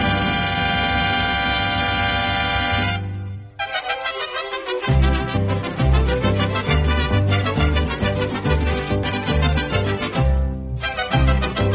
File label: world war music bgm instrumental